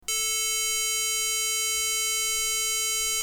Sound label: FLATLINE